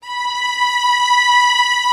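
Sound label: Vio legato B4; Digital Sound Factory Virtuoso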